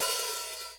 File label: Yamaha RX-120 High Hat Open